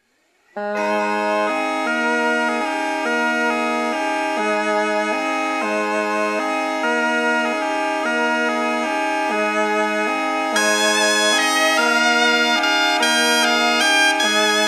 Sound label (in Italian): Tradizionale campana; Quanno nascette Ninno